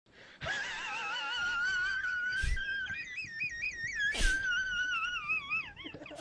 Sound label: laugh; raidcall